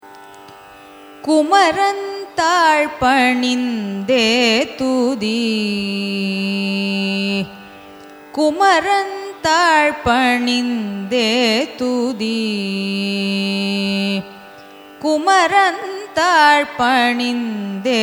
raga yadukula kambhoji carnatic; carnatic music

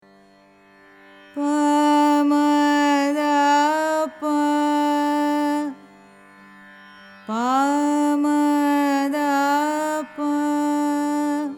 pantuvarali; carnatic music

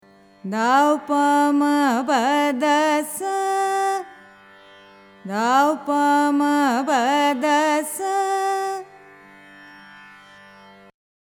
saveri, carnatic music

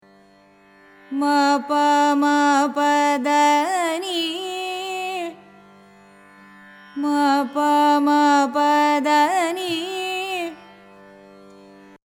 kalyani, carnatic music

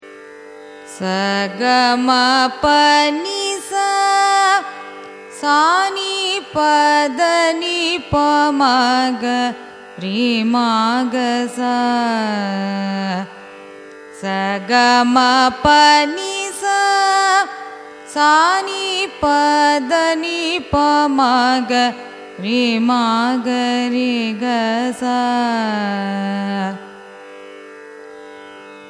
raga neelambari arohanam avarohanam; carnatic music

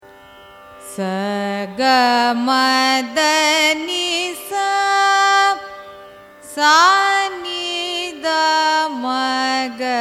raga hindolam arohanam avarohanam; carnatic music

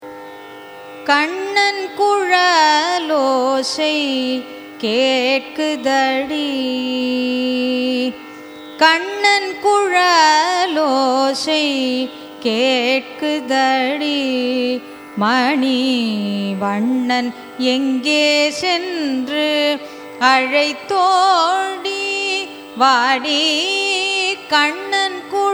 raga thilang carnatic; carnatic music

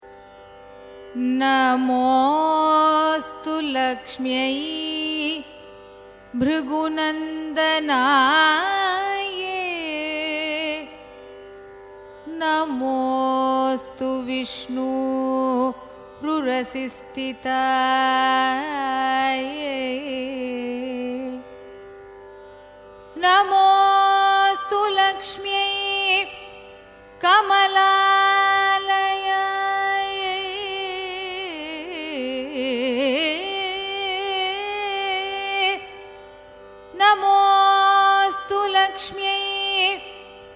raga lalitha carnatic sloka; carnatic music

